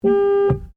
Car Ford Focus Horn Long Interior; Ford Focus hire car; Vehicles; Cars

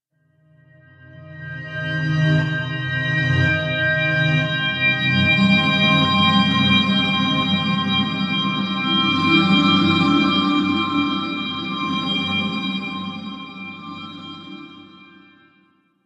Dimensions Future Vortex, Sci Fi Music

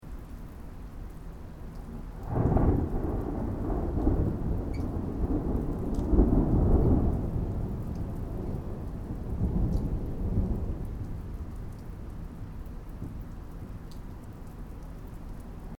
THUNDER DISTANT STEREO; Elemental weather effects

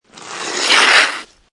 Snow And Ice Ice Skating Brake And Stop; Ice Sound Effects; Ice (Sound Effects:Weather)

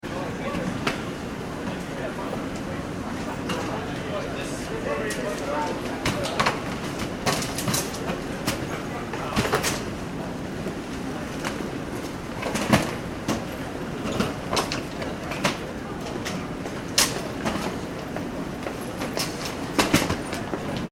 ambience airport baggage reclaim; John F. Kennedy (Jfk) Airport; Airports; McKinney Sound

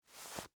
Jeans Hand Out, Foley, Clothes, Take, Of, Pocket; Dressing Sound Effects; BlastWave

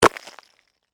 Impact Ice Block, Hammer

